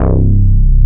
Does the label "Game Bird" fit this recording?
No